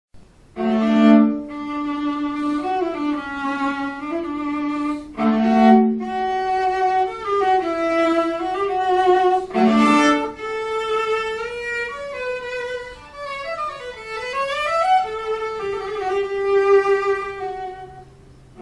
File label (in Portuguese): Viola, instrumentos